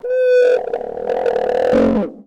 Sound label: hunter pre attack; video game; source engine; portal2; outland